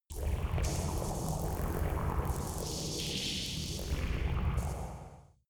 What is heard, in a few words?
space inside biomedical alien spaceship quiet